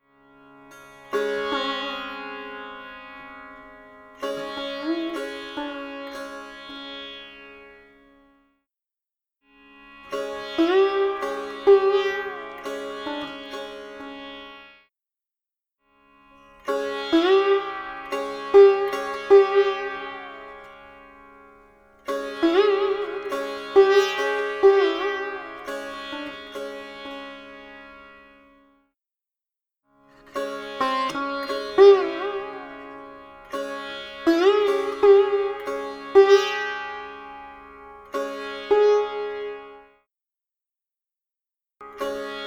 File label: alap phrases